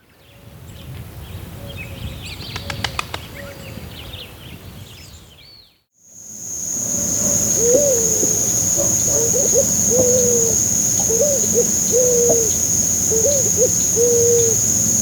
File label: White crowned Pigeon